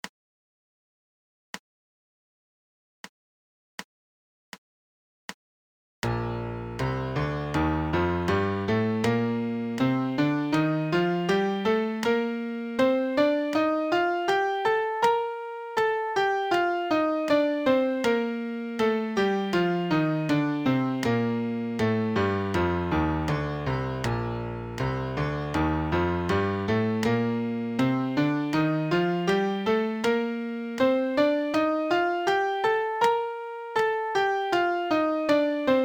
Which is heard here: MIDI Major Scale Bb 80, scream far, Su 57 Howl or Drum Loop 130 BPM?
MIDI Major Scale Bb 80